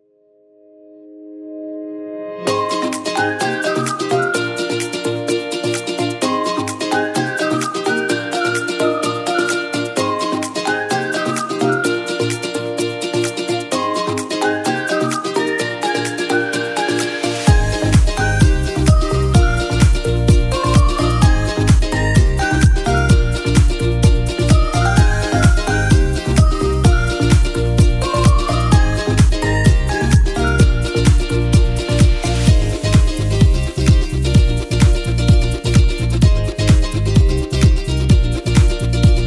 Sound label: background music uplifting fun kids